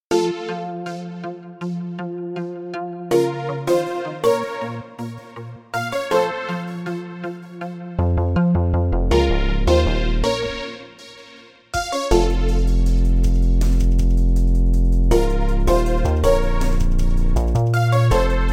villain instrumental

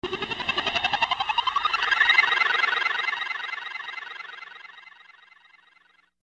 stereobeep sample; djtyro

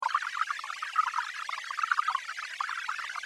noise

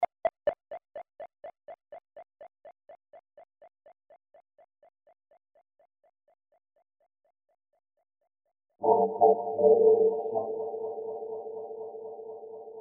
loop bibeldibiep

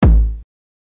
909bd sample; djtyro

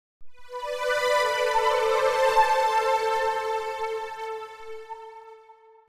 strings

